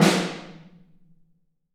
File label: SN, ST AMB SNARE